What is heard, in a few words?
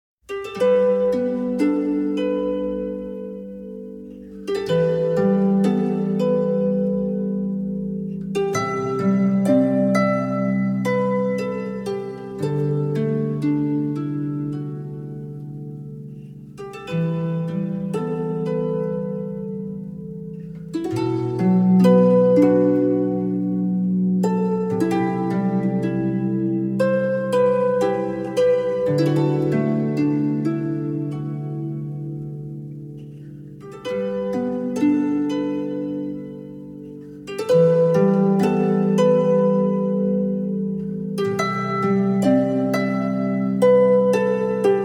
harp